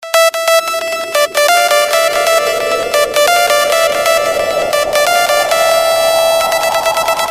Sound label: Sms alert